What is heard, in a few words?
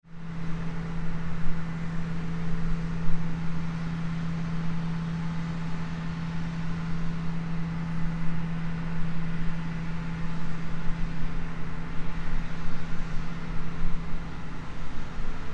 j-remover at 8f